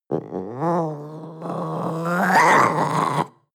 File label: panther young snarl